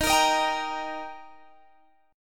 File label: Eb Major, Guitar Half Step Down